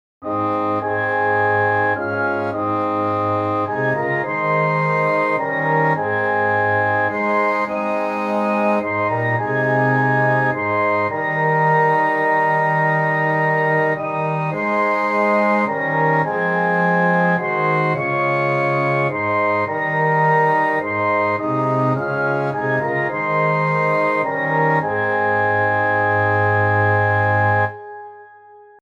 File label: Orchestra; Hymns